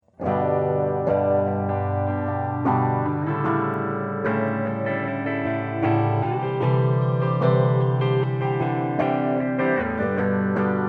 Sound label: slow eight stage guitar; phase shifter